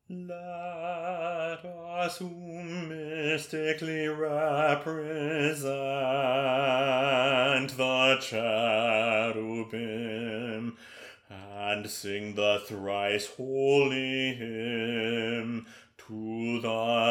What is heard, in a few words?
Cherubic Hymn Bass